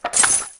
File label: Drop Gold Tree; endless world idle rpg gamerip 2019